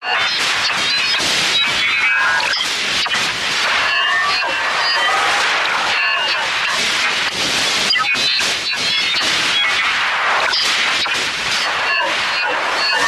futuristic radio interference chatter magic spell cast conjure craft mobile app